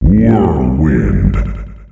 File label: whirlwind